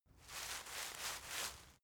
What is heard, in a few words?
rustle foliage short; berufe; foerster